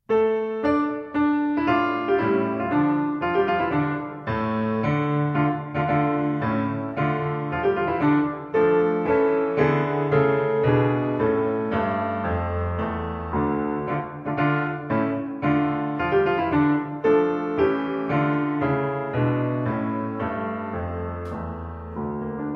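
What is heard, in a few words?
The Two Grenadiers Piano Accompaniment, Suzuki Violin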